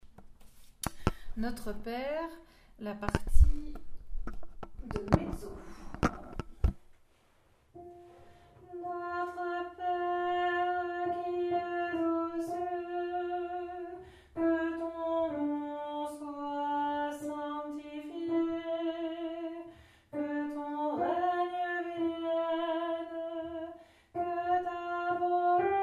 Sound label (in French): Mezzo; Notre Père